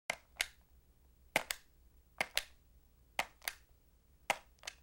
button; WMBonusXP

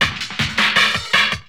DRUMLOOP D+B 160, Drum+Bass Loop; eJay

